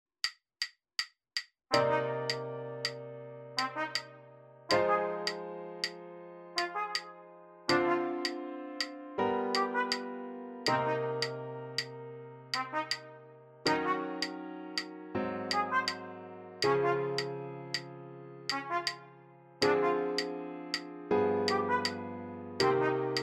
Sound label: Rhythm with 1 and 3